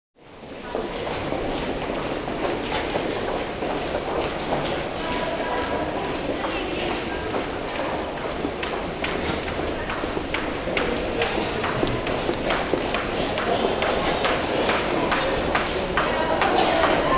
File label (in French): Parking, bruitage Transports